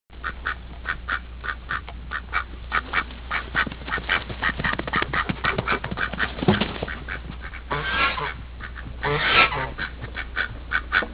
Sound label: gooses